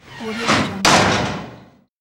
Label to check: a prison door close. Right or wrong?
Right